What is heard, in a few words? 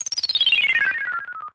dice spin